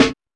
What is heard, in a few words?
Techno C; EMU XL-7